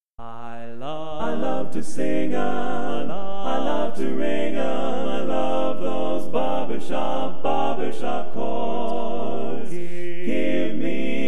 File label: Bass, Classic Tags